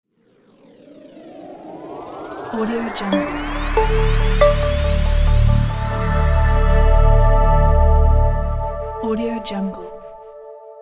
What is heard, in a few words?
minimal logo